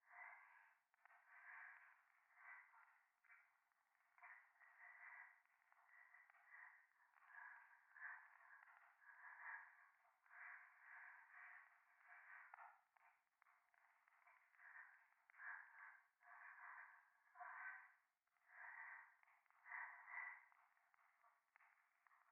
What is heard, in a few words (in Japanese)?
夢の中 右耳快感吐息 暖炉